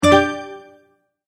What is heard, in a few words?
multimedia tone start